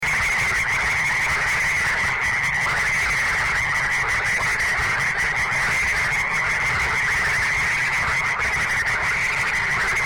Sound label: ranahyla